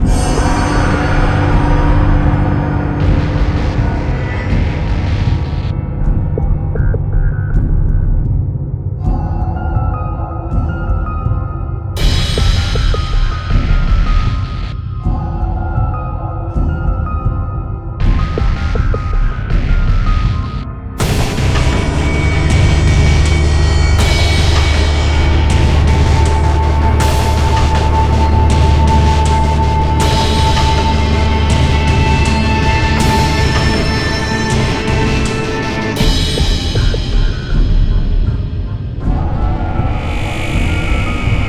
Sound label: Musik Alien Exploring